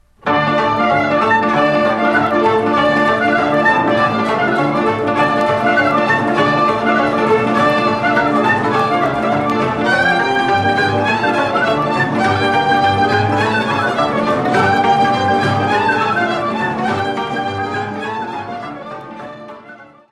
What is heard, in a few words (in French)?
Ciuleandra - Roumanie